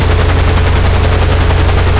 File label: car engine drive loop; vehicles